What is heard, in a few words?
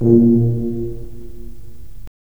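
brass tuba; LA Composer vol. 3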